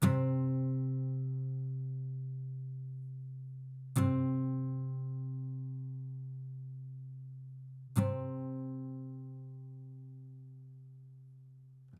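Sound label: acoustic pattern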